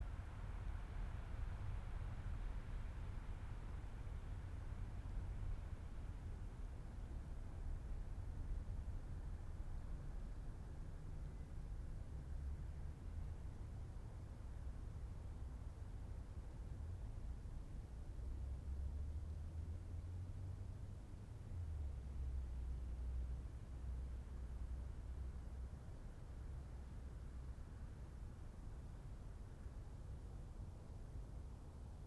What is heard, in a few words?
yamanakako sound